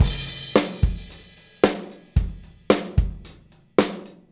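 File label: 111 bpm, beat loops; Zero-G; Monster Beats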